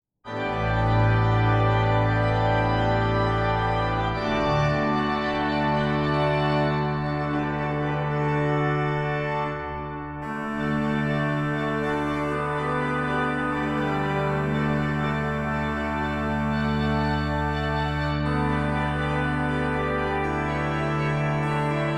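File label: trumpet voluntary d major, organist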